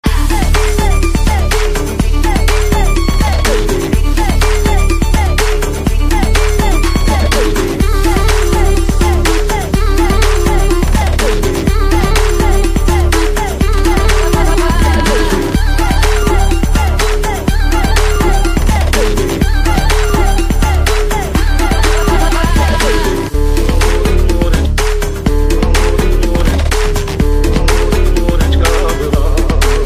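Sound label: song background music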